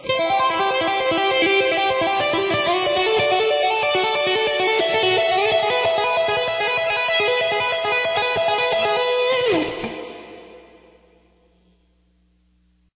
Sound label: tapping, guitar